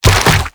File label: Proto Infested Mutalist Speaker End Flesh; Warframe; Abilities